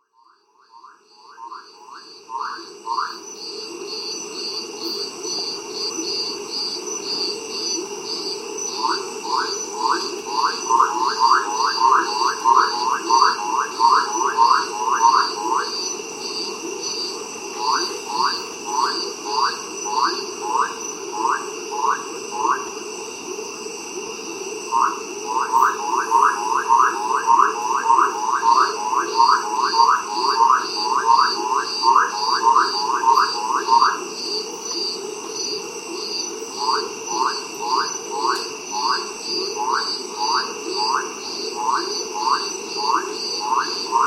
coastal insects and frogs; soundscapes

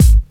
sharp steel kick drum g sharp key; kick drum samples

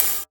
good open hi hat one shot D# key

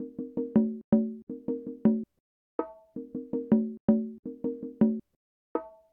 bongos and congas; percussion loops; resample

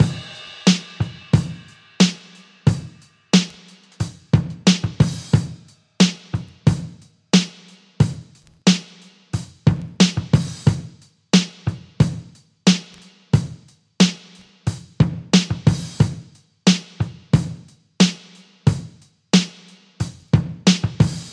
90 bpm drum loop sample e key

